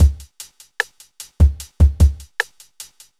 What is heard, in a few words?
hiphop